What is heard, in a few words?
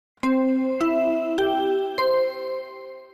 body discovery chime